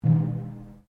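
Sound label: Drum Taiko Cylinder Rope piano, Japanese cylinder taiko; Miscellaneous Musical Sound Effects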